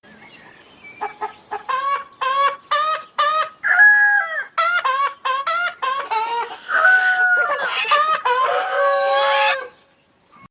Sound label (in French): Coq chante la MARSEILLAISE